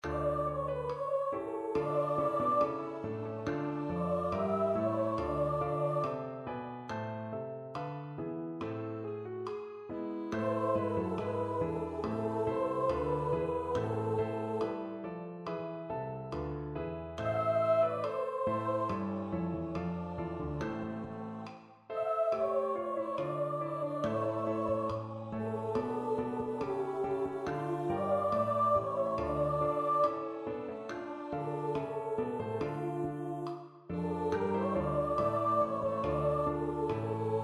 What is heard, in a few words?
Chor Sop; Chor Soprano; Durante